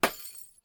Bulb smash; Phasmophobia

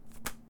Paper drop sound; Phasmophobia

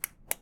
Flashlight on; Phasmophobia

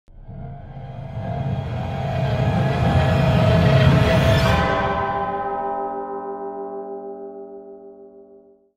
Death Jumpscare Riser; Phasmophobia

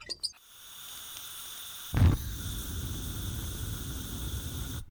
Lantern turn on; Phasmophobia

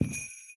foot; Phasmophobia; Santa

